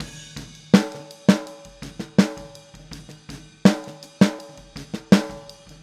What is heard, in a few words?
Boogaloo Chorus Snare, 82 BPM; Drums from the Big Room The Mixes